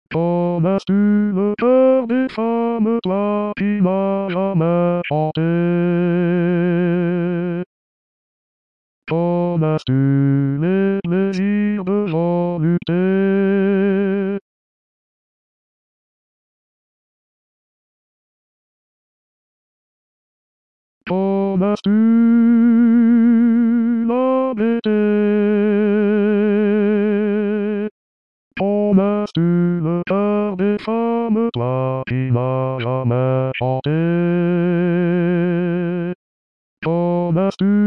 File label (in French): Hommes